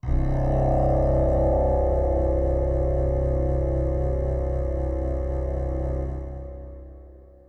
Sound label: synth pad ambient 160 C; Sphere